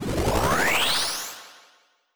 strengthen

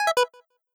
button down

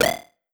button

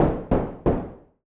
door knock iron synth

